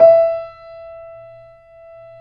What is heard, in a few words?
KEY, YC7; Rhythm Section